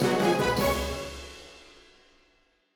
Music Segments Fight Joyfull Theme Bpm160 End; asterix obelix slap them all 2

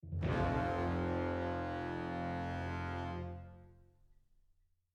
Sky Dive Landing Soon; the legend of zelda tears of the kingdom

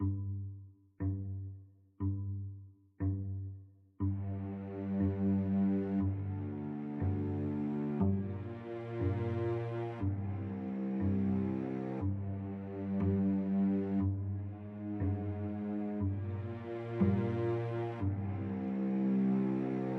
Tension Start 120bpm 10bar; project zomboid

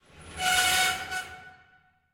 Creak Metal High

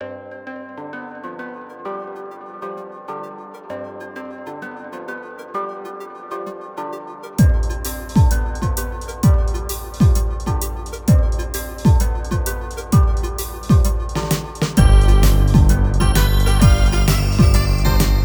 Level Sparkly; Rotund Rebound

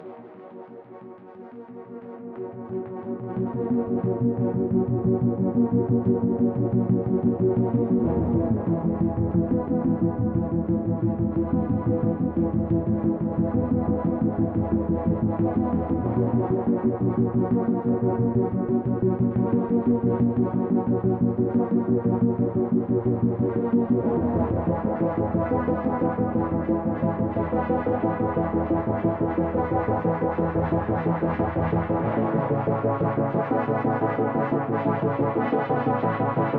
Space 2 reverse synth

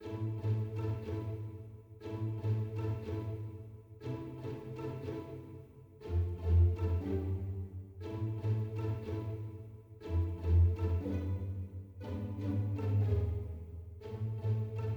heroic soft

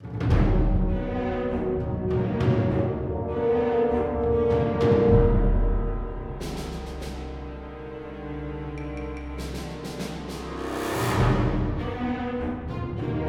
Galatic Starfighter Music; Star Wars The Old Republic Rise of the Hutt Cartels and Shadow of Revan

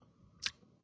Chewloop

